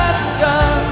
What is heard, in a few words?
sample, male laugh